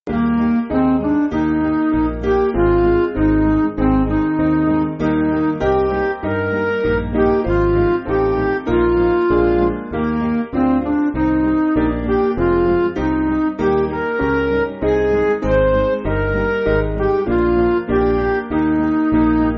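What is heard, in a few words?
Midi, Music Backing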